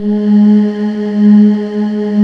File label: YAMAHA FM; OTHER SYNTHS; Rubber Chicken Software Sample Bank